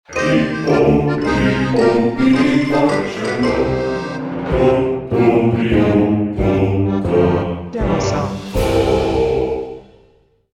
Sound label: Pirate Choir